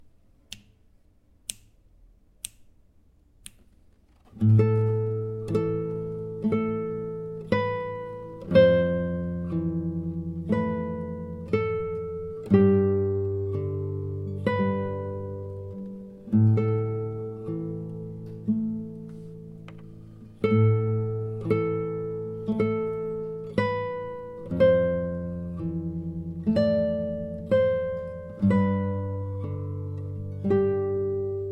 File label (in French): melo celte lent; melodie celte